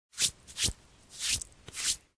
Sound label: human hand